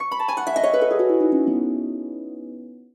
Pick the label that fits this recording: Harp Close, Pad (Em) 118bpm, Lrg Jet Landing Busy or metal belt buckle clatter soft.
Harp Close